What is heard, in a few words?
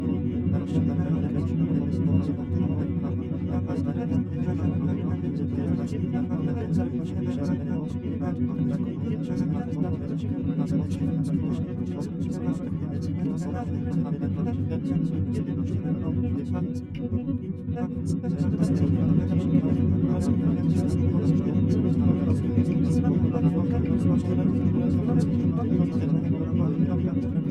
Fen Churches Sound